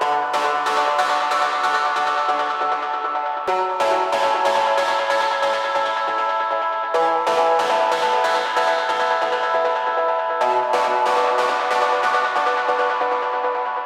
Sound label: Special Sounds Root B 138 BPM; vengeance essential club sounds-3